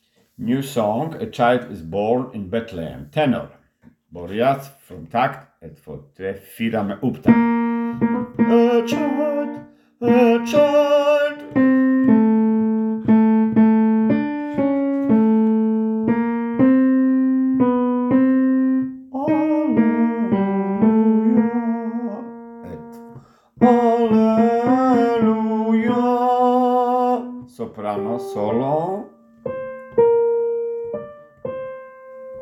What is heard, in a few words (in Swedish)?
tenor